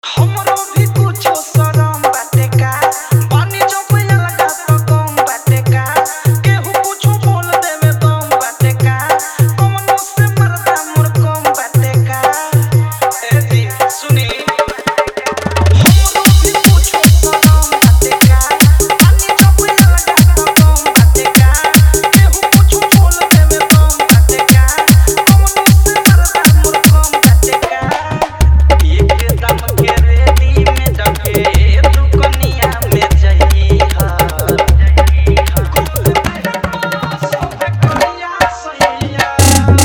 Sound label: Dj Song Hard Dhollki Bass Mix